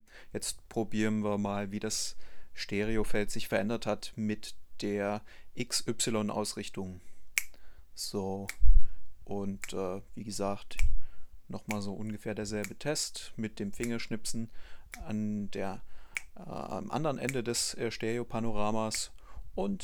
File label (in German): tascam dr-40x xy-ausrichtung